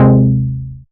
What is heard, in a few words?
stab morbid F; stabs